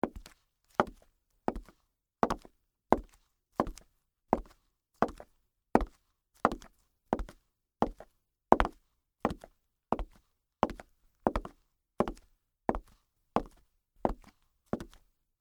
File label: Pumps Walk, Footsteps, Peep, Toe, Concrete